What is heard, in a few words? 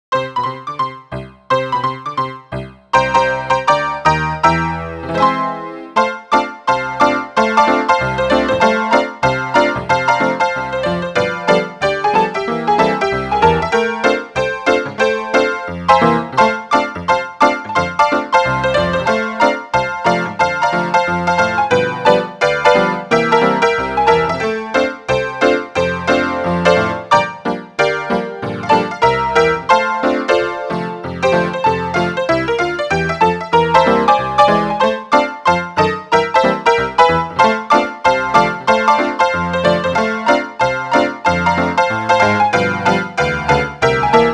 music music music